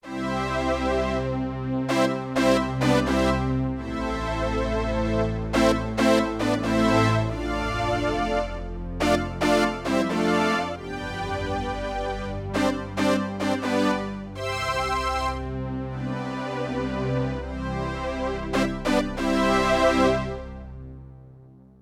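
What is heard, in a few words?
Strings-Brass Velocity Crossfade, Yamaha TX16W; Synth Set 1; Str-Brs VelCrsfde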